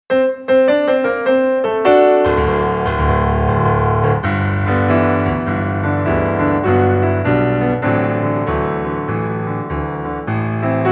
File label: solo piano